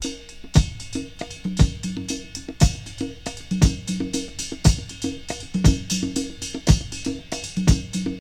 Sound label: 117 bpm fresh drum groove c sharp key, kick tuned to the C# note